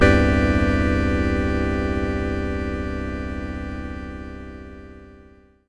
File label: SYNTH Organ; Inverness